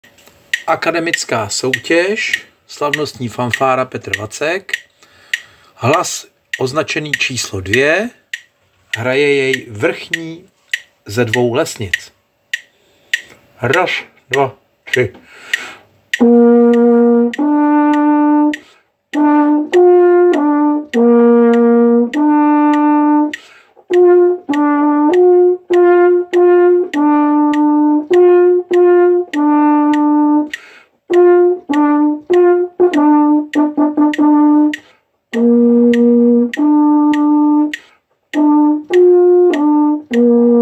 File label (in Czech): Slavnostni fanfara hlas, lovecké rohy; Akademicka Slavnostni fanfara